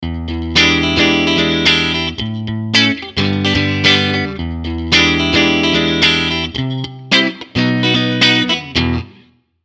Phantom Stratus

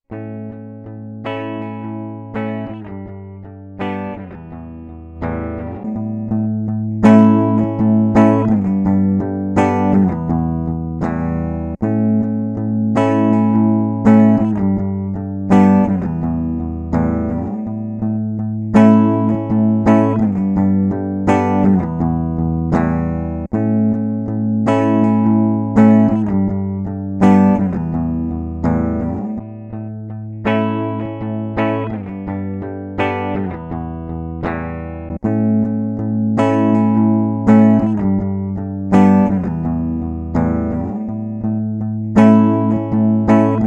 Boss EQ 200 Mountain Clean

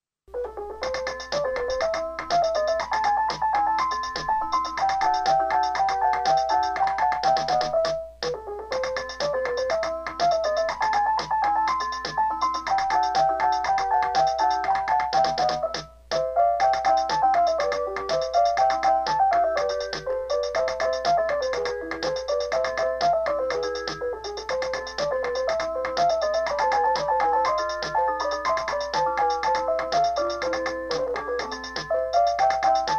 casio sk 60 demosong

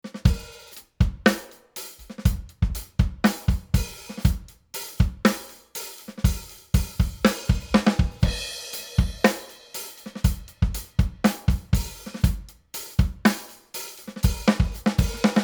Rupert Neve Designs 5254 Drums

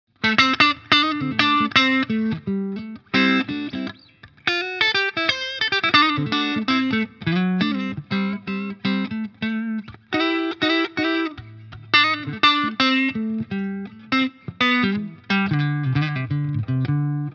Orange Super Crush 100 clean

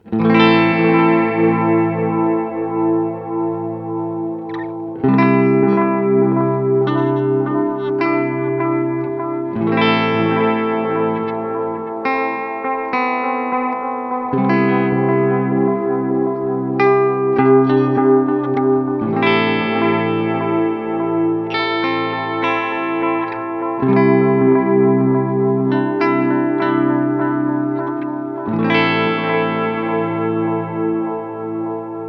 Echosex Dark Setting; Gurus

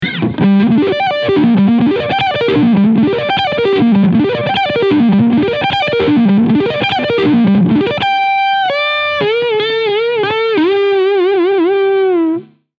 ARPEGGIOLINES

